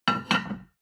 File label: plate on plate place soft; sport-freizeit; restaurant-cafe